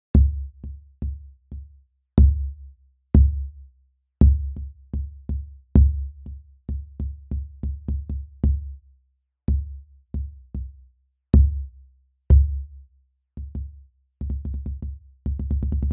Chromaphone 3

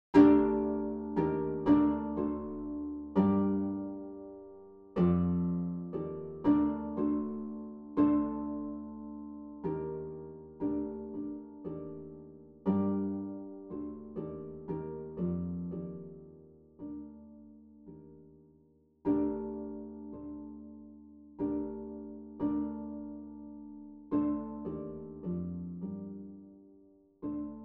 World Instruments

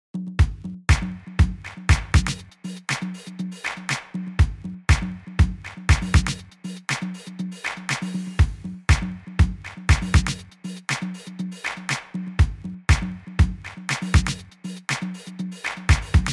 BBox

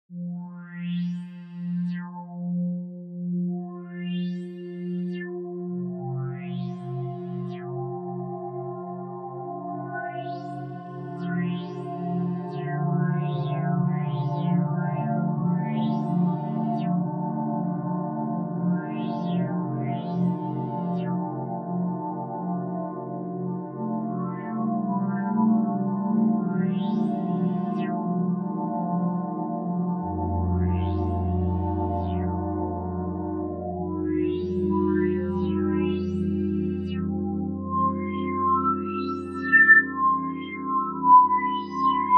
Drifter

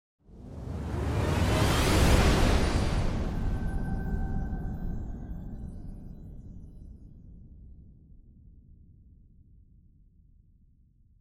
RISE HIT

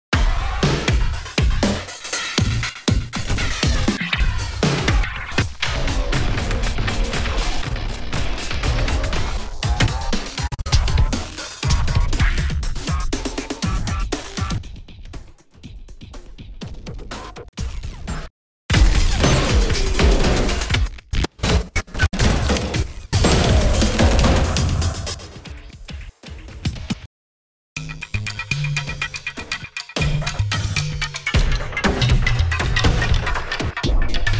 DAMAGE

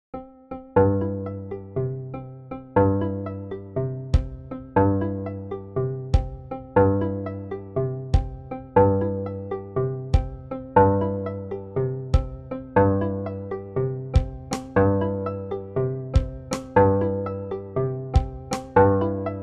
Beat Designer

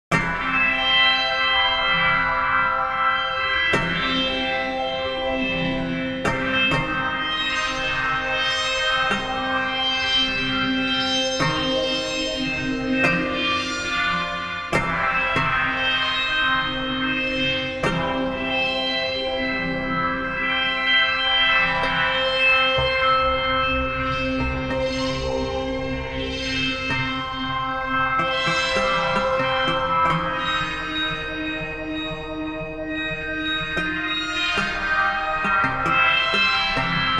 String Studio VS3

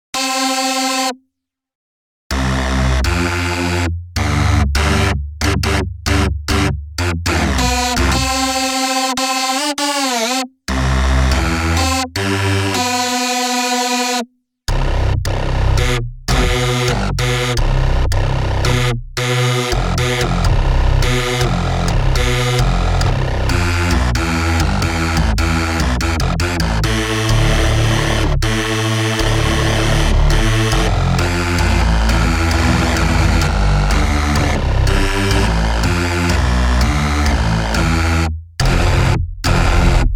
splash

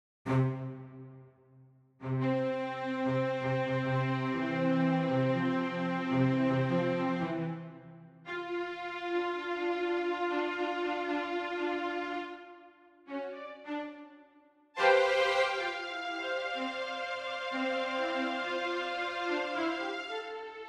Studio Strings

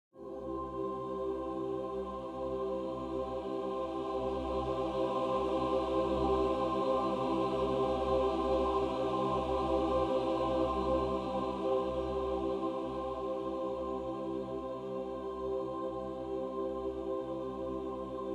Choir Micro

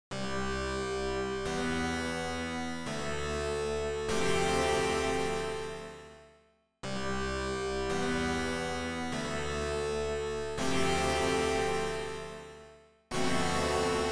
Synclavier V

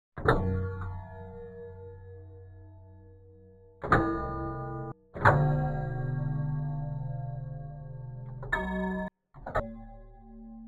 Haunted Piano

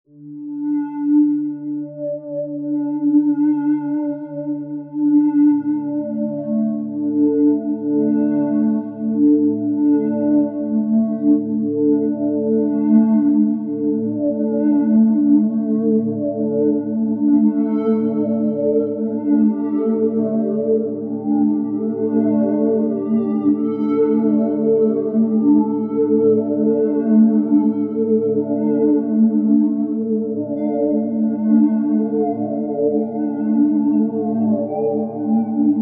Ultra Analog

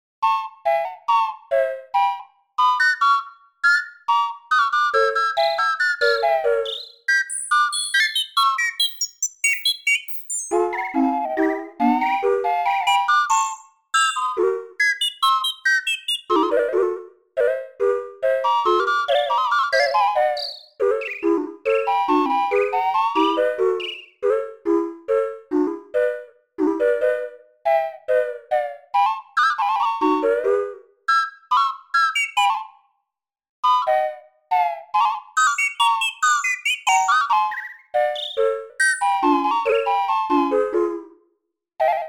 World Percussion

